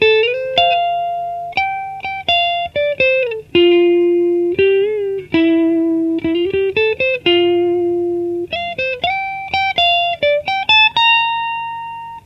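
Les Paul clean neck, Neck pickup, Канал clean Fender Frontman в линию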